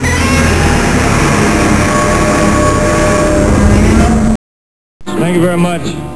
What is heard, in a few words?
roar